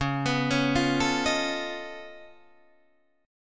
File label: Db Augmented 9th Db down Guitar Open D